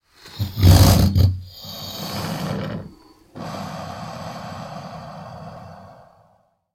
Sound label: Alligator Chuff Brea